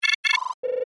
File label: Multimedia Error, Incoming, Call; Multimedia, Element; Buttons; BlastWave